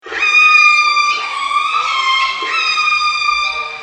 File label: orc singl